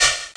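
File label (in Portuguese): sopro, blow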